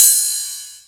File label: Ride, Cymbals; Vengeance Samples